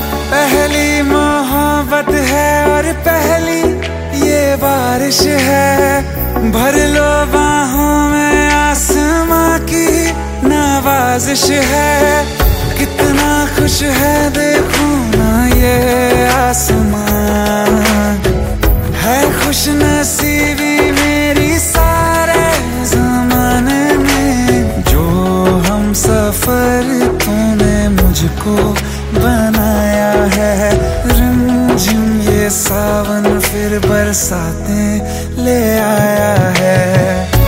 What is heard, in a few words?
Song Tone